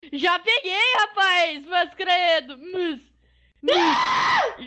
eaaaaah

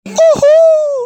uhuuuuuuuuuuuuuuuuu

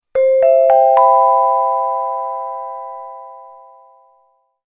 announcement sound effect

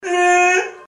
mating call

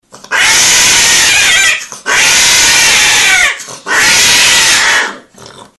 cats mating scream

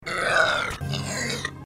burp

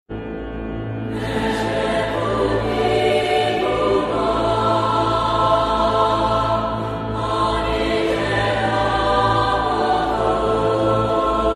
pain

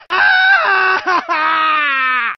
male panic crying scream; gta san andreas

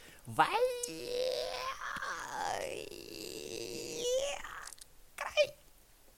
cry

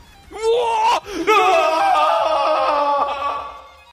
cry

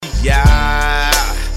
yeauuuahhhhhhh

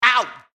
rraauw

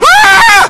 voice cracky scream; gta iv

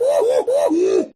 gift sub alert

